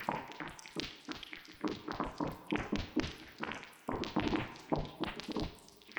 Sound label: Tonal Re Draw, sparse soundscape